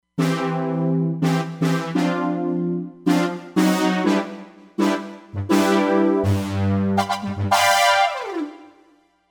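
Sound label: Bend Synth Brass, Roland Fantom XR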